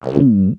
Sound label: eating sick; MiniGames